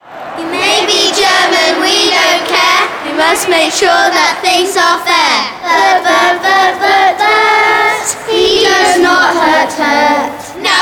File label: Chants; Bert Trautmann; petersfield; music and history projects